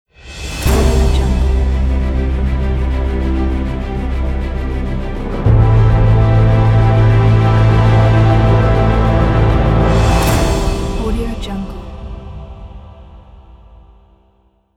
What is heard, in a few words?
inspiring adventure logo